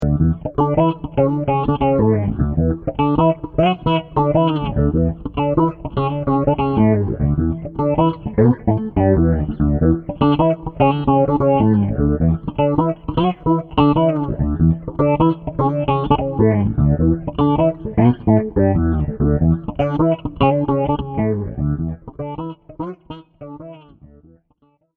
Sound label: 70s unisono